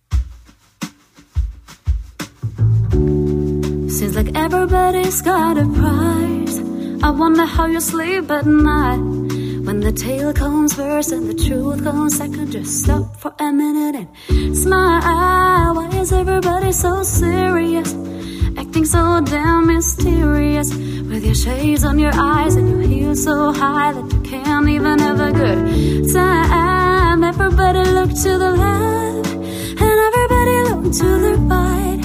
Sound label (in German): Quartett, Lounge